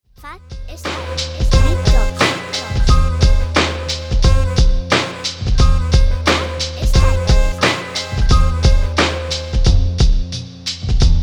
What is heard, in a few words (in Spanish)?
Muestra Beat